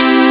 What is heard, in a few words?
chord; ST-XX Amiga Soundtracker Sample Packs